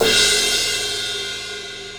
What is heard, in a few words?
18 inch fast crash, cymbal; aax x-plosion; Sabian